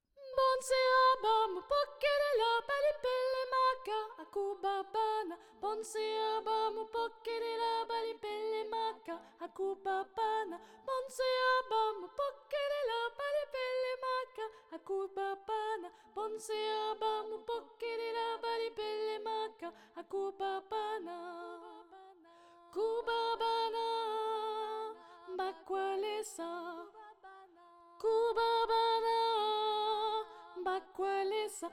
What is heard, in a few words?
tenor; bonse aba